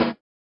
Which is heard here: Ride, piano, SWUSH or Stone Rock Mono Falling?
SWUSH